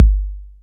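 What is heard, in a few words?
808 kick, drums perc, Roland; Rubber Chicken Software Sample Bank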